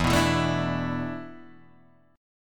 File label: E 7th Suspended 4th, Guitar, DADGAD